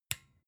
desk lamp on soft

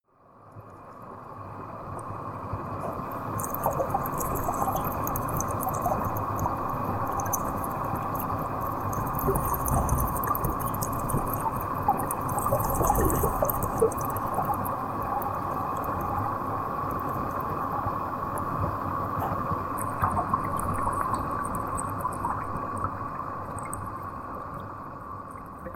water fx sizzling

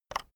keyboard external enter release soft

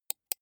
computer mouse right click soft

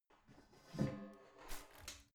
garbage can close lid soft

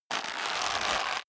leather creak short hard

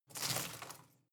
home plant leaves rustle regular short

